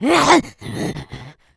become enraged, female

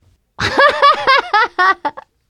laughter, teen girl; player; survivor; voice

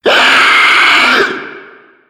hunter warn; voice

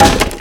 wood solid impact bullet; physics

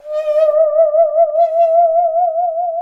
saw danger, music, zombat

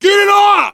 scream while pounced, biker; player; survivor; voice

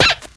concrete, footsteps; clown

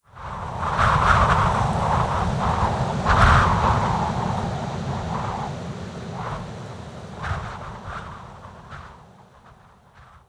random wind gust

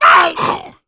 pounce miss; hunter

